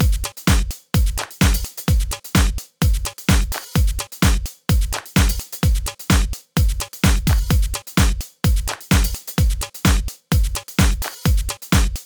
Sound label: House Beat 128 Bpm